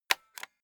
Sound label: stapler staple loose